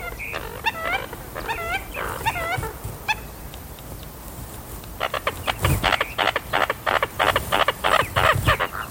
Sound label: american coot